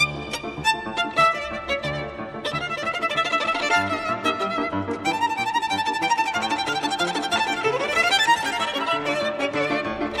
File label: czardas